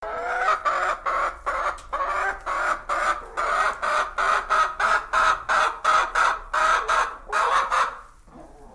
big bad chirp

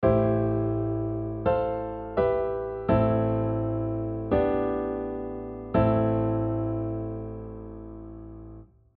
parallel tonal; Chords in 1st inversion forming a melodic line over an A pedal note

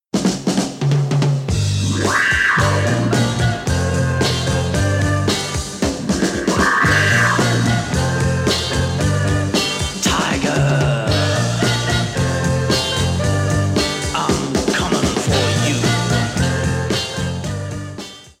Organ & drums & vocals (female); Flashback

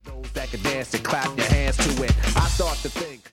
Vocals ('clap your hands to it') & instrumental

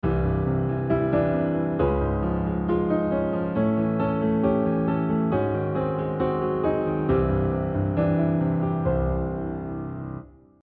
chromatic mediant

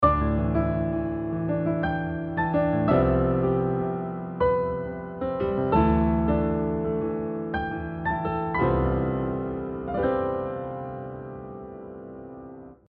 pandiatonic

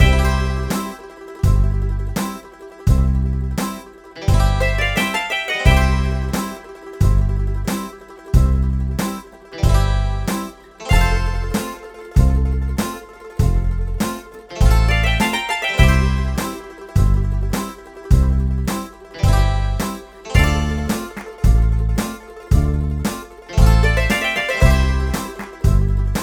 sirtaki

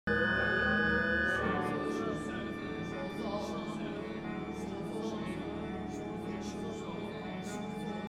Clocks And Clouds; Ligeti

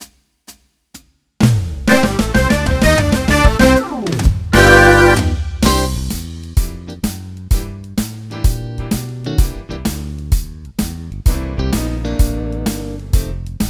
BLUES

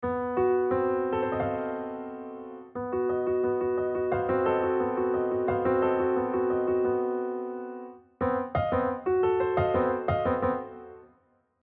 polychords presented in diff ways

